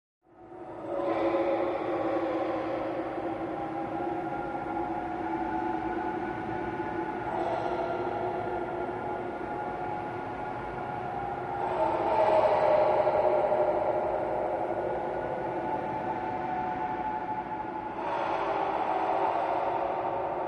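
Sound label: Horror Scifi Background; horn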